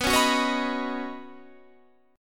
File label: Db 7th Suspended 2nd Suspended 4th B, chord shape